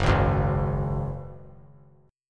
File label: F sharp Suspended 2nd; strummed; JGuitar